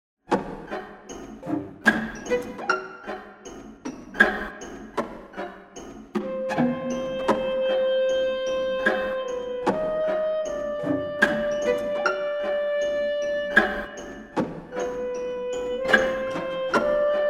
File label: Valse Brutal